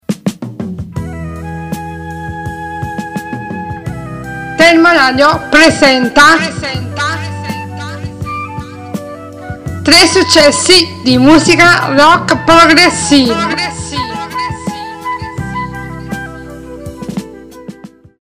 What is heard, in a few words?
progressive, jingle; successi